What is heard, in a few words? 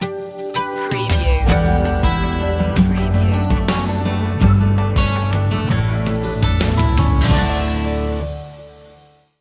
water gardens sting